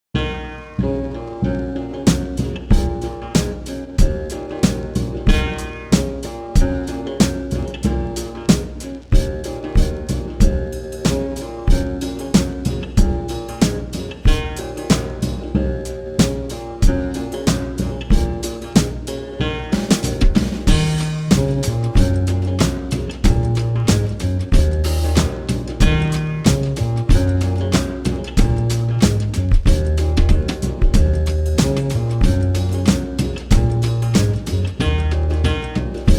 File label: countrysounding w asian sfx